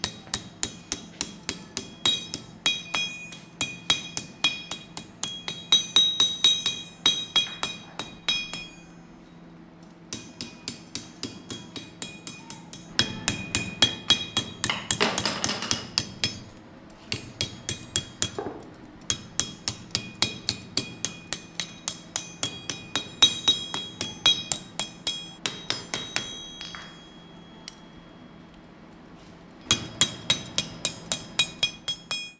Forge

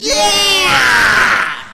Yeeeeah

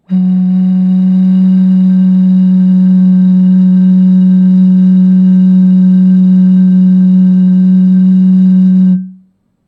Small bottle

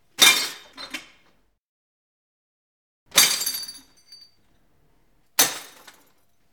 Small Glass Bottle Crash

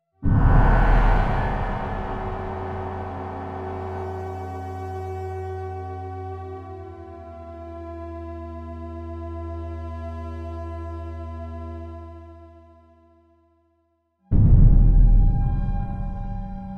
Ashes And Gas

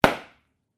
Bang Clean

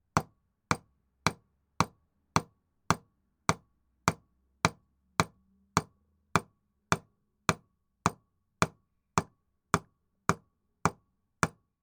Hammer Wood

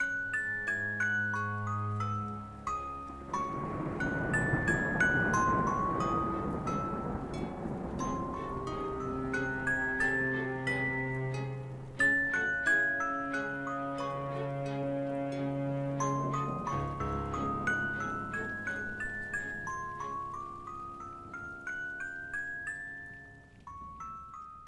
Magic Rainy Atmosphere

